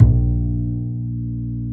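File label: double bass A#1, Jazzistic; Univers Sons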